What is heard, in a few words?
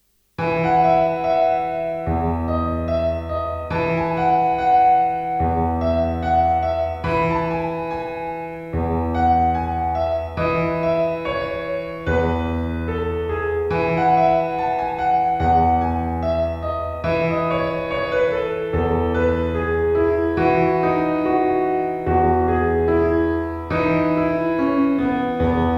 piano pop